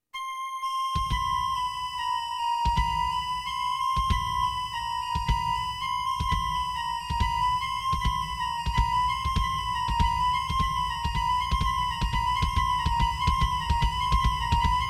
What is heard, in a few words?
Bat Attack; as recorded from the Roland Sound Canvas; The Dagger Of Amon Ra; Additional Themes